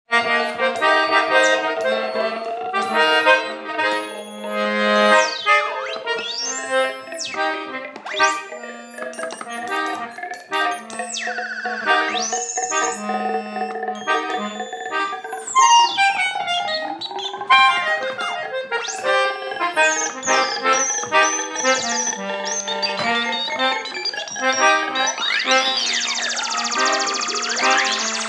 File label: impromptu noise jam